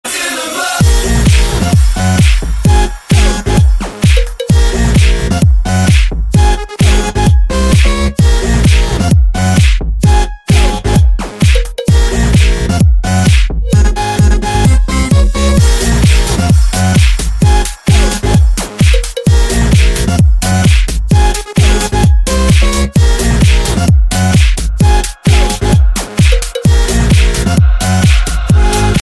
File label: electro ringtone